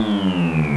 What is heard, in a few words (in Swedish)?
larm; Congratulations!